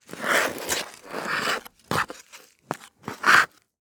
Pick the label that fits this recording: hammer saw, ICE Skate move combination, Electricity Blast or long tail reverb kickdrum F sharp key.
ICE Skate move combination